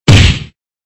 High Impact

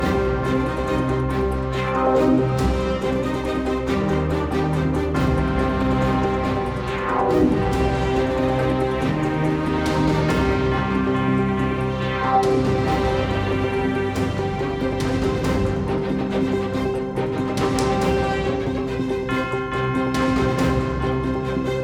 Enemy Turn

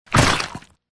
Enemy Dragon Dead

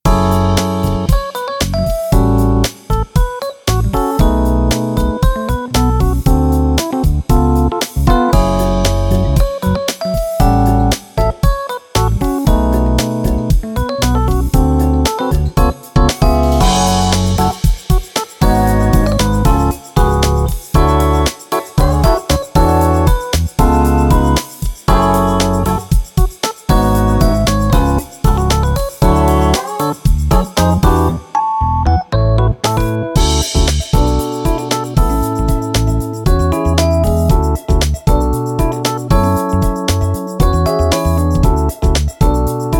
Music Menu event; ebaseball professional yakyuu spirits 2021 grand slam switch gamerip 2021

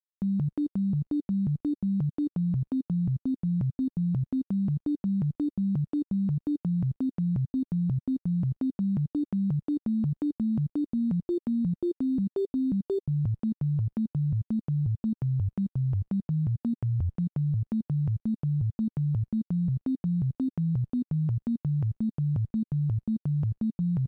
harmony; Game Builder Garage

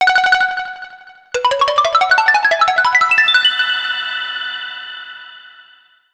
Wind Marimba; Legend of Zelda; Link's Awakening

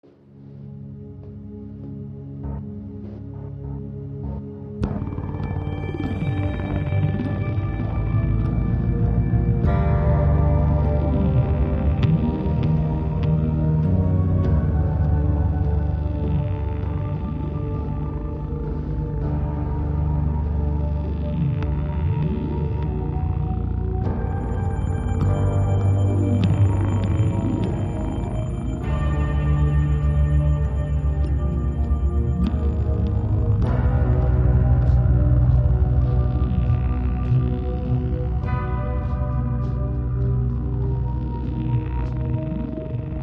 Loop Tense; Hyperion; Tales from the Borderlands

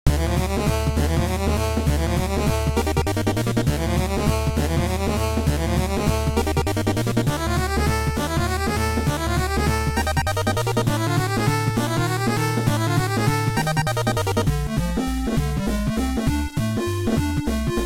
bgm boss

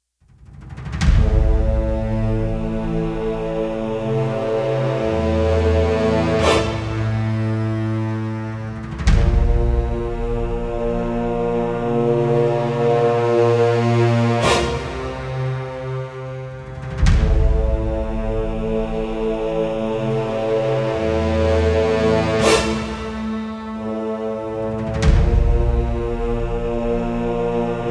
Bomb Airport TENSION

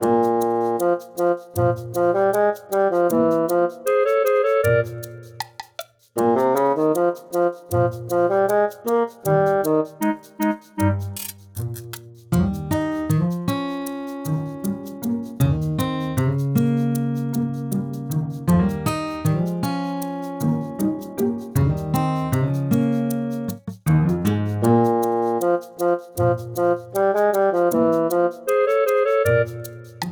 bgm event comical

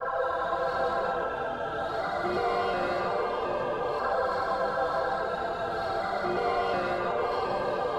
Long Hallway E Ambient

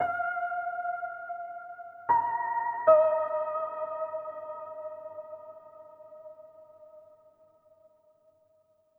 Overlay Tone; The Shard; Mirror's Edge Catalyst

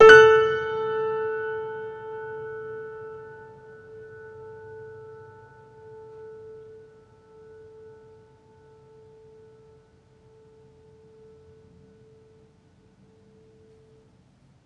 Music Level Fight Stinger; Florence

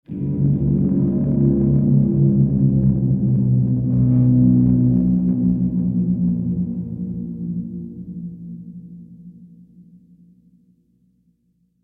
Scary Guitar Mood; the last of us

